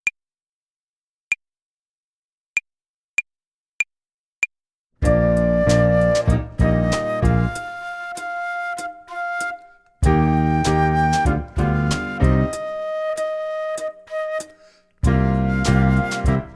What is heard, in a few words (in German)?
tempo und rhythmus uebung hihat play along